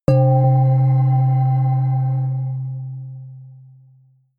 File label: melodic odd ghost bell low